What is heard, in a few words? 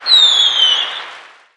Music Frontend